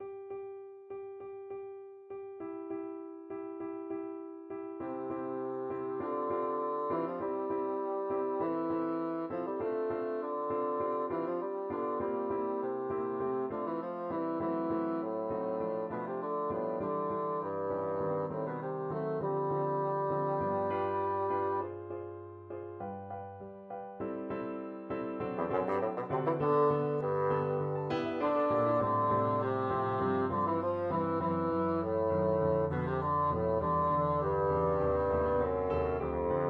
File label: bassoon